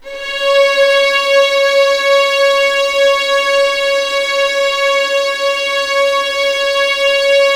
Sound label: C#5, String Collection; East West; The Ultimate String Collection